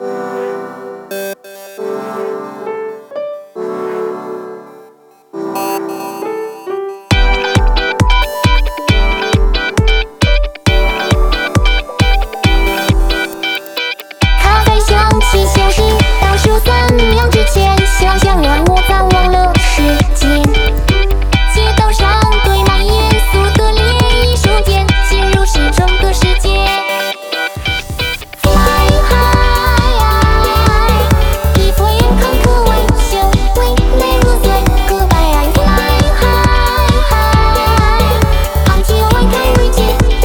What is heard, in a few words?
Interlude